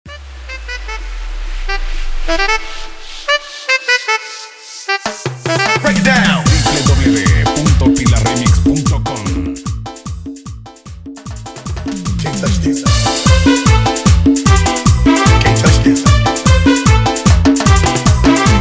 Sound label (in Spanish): Tecno de los 80; dimax dj mix; bpm 150